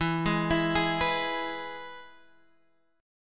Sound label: E-Major E, Guitar Standard; arpeggiated; JGuitar